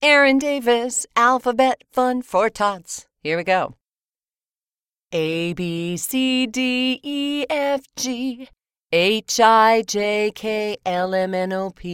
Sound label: Singing for children